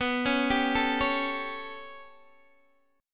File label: B 7th Suspended 2nd Sharp 5th down Guitar DADGAD, chord